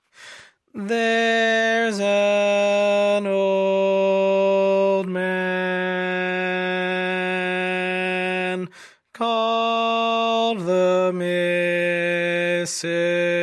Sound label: barbershop tags